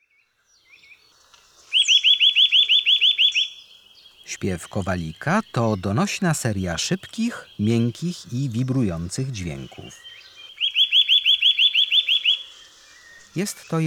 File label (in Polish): Kowalik, Ptaki; Kurs PTTK